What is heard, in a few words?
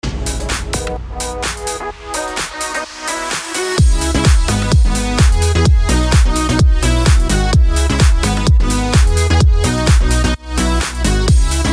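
house, C# minor, 128 bpm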